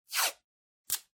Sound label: Masking Tape Pull Rip